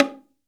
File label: BONGO, STEREO BONGO, Cuba